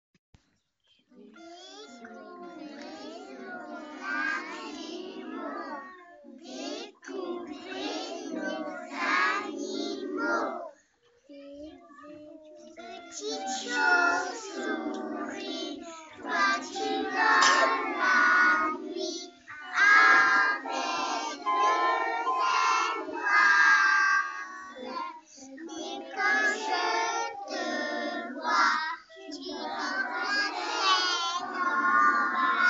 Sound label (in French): chauve-souris